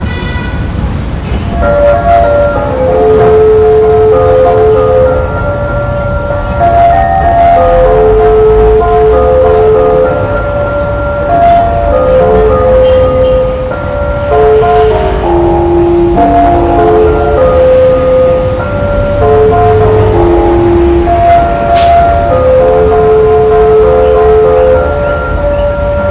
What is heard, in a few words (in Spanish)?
Reloj Torre